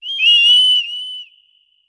Whistle; Zelda whistling for her Loftwing (Recorded); zelda sounds; Skyward Sword

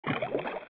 Tektite Water Turn; Zelda; OOT

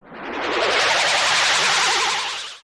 Attack; Morpha; zelda; OOT

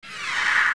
Lurk; Poe Sister; zelda; OOT

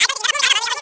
Talk

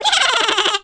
Miniblin Laugh

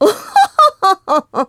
Laugh; The Lady laughing

